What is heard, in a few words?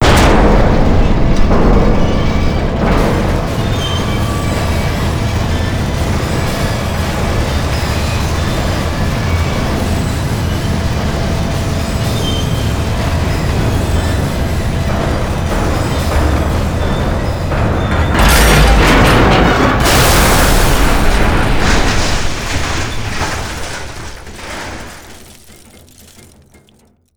crusher; playonce level animation sfx; Portal 2